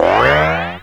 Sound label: Spring; TinyTanks